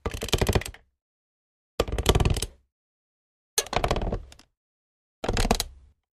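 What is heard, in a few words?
Chair Office Heavy Creak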